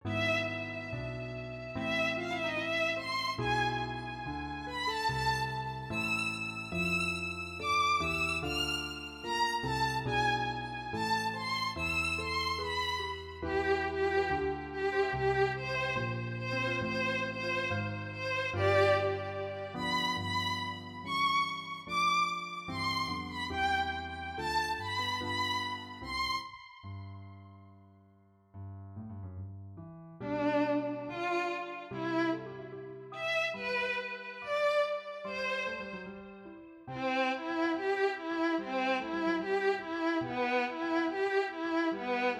per violino e pianoforte Adagio ma non troppo; Opus 101; attacca

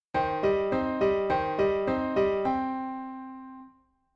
Battuta Per pianoforte; Fine 1802 – Principio 1803

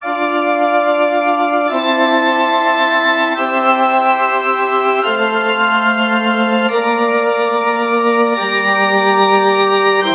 Esercizi di contrappunto a tre voci

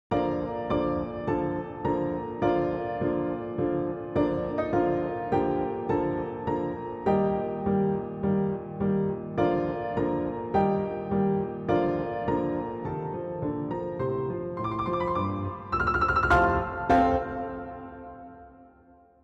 Trio per pianoforte violino e violoncello; Beethoven Ludwig; Esempi musicali

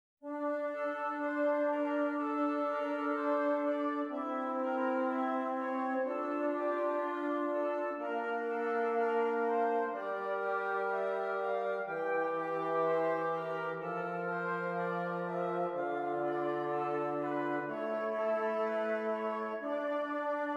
Esercizi di contrappunto a tre voci; Dritte Gattung

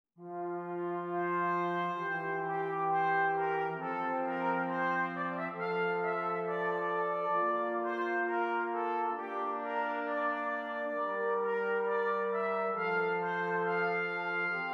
Esercizi di contrappunto a tre voci; Dritte Gattung; Ronge pagina 65

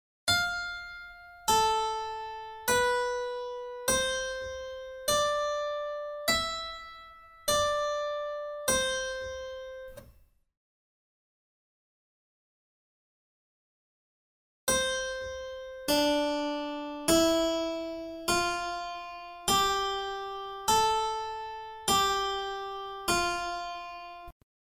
Tema e risposta

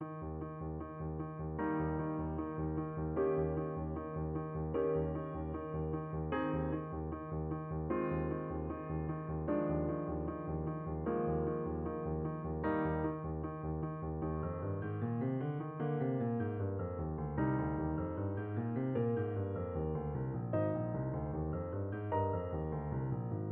Sonata per pianoforte; performing edition di Graziano Denini

